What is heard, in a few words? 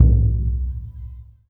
lo drum, Burundi; Spectrasonics; Heart of Africa